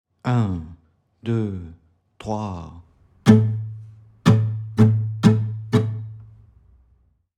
exercice 060bpm 1 mesure

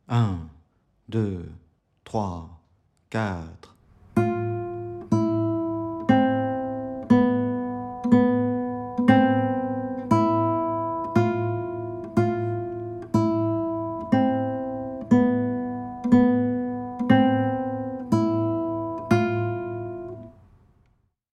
exercice 060bpm

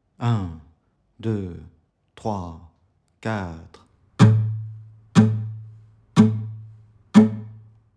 exercice 060bpm 1 mesure

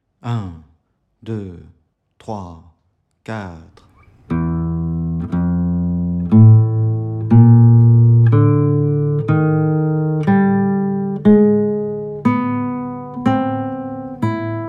exercice 060bpm

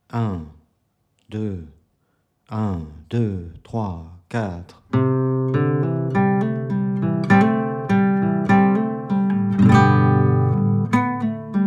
exercice 100bpm

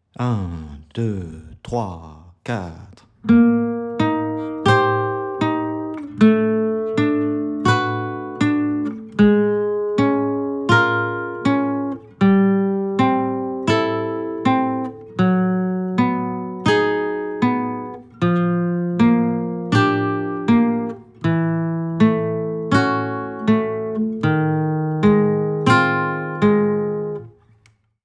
exercice demi barre sur 5 cordes exercice b 080bpm

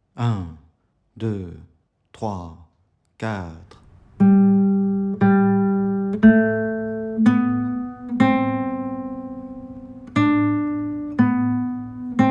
exercice 060bpm

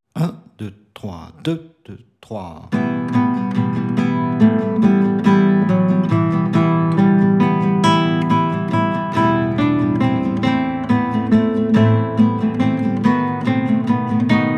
exercice 140bpm

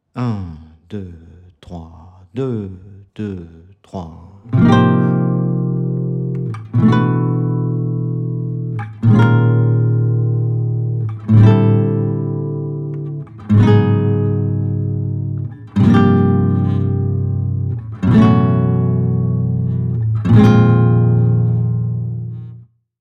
exercice grand barre exercice d 080bpm, guitare

